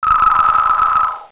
PHONE, 电话; 特酷声音素材库

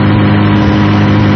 PickUp Sample; 暴力赛车

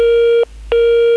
PHONE, 电话; 特酷声音素材库

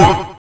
Game, 游戏音效; 特酷声音素材库

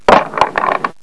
PHONE, 电话; 特酷声音素材库

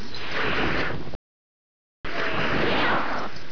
Water, 自然, 水声; 特酷声音素材库

